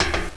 metal

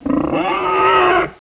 voltigore attack melee; svencoop

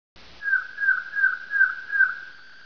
gull, ambience; cstrike

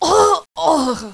shot, female

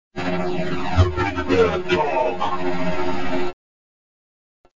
big bad dog; svencoop; keencity

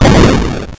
shockwave

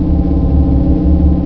low power engine

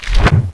claw strike; svencoop; cryocell

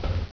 footstep metal; footsteps; gearbox

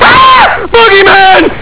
ahh; tfc

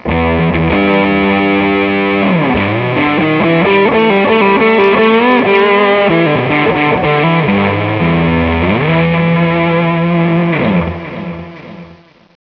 guitar; svencoop

